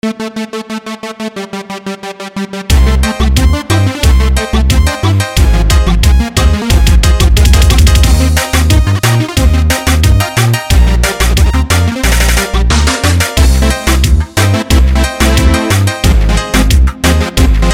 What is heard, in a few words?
TECNOBEAT